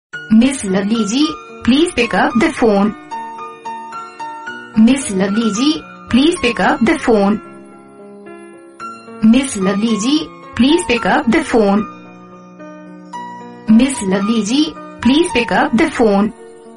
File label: name song